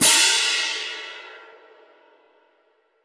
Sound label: Concert Cym; Roland BK-5